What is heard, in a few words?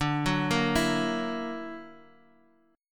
F sharp Augmented 7th D, chord